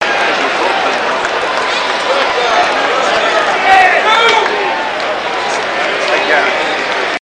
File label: Sports Bar Patrons Loud